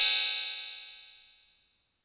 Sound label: Hihat open